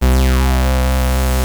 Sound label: OB 19 C3, Rare Synthesizer; Hollywood Edge - Giorgio Moroder Rare Synthesizer Collection; OB 4 VOX.